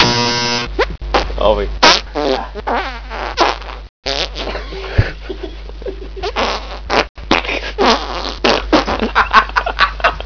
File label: noise